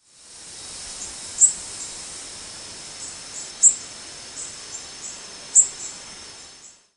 flight calls warbler female, Golden-winged Warbler